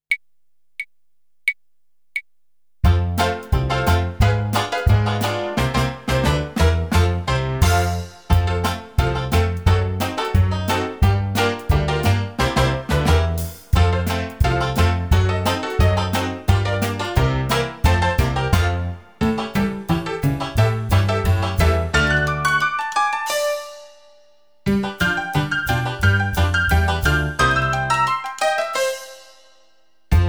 Spinragtime

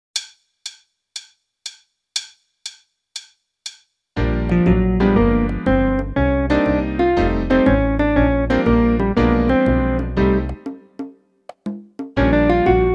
Solo Swing; Meespeel CD

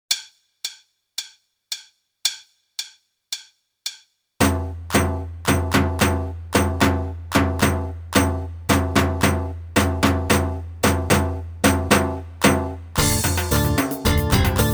Drum Solo

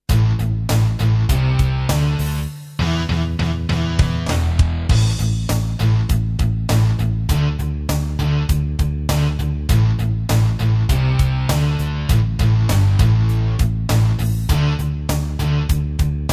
Rattlesnake Rock; Meespeel CD

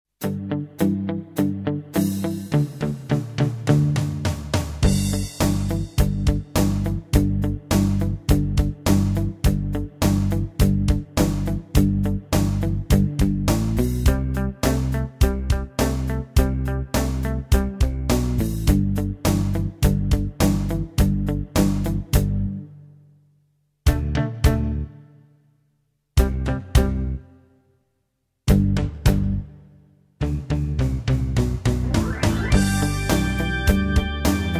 Leather Jacket Rock; Meespeel CD

